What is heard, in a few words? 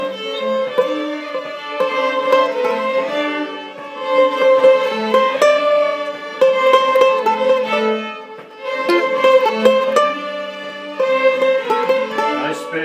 Ethiopian Tune